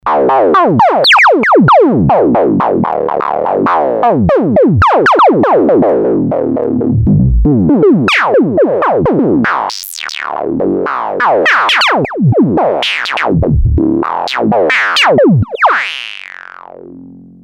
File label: torsion, novation bass station 2